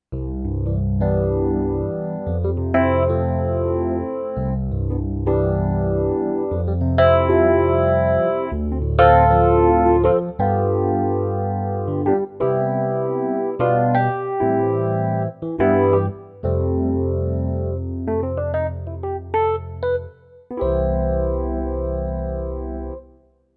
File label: FP-50 Phaser EPiano